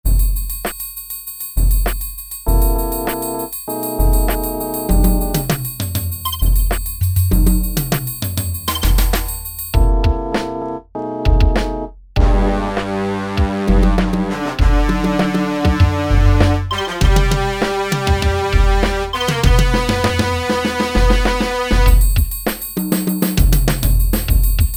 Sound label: Swing Hop